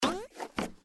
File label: Jump; Realm Saga